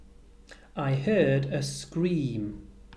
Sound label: r scream